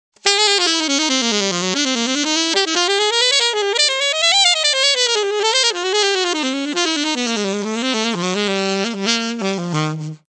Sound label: saxophone alto